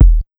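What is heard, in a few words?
BIG 125 bass drum, Big Beat Drumloops; USB Soundscan vol.25; AKAI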